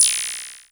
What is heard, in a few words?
effects, drum machines, FutureRetro FR777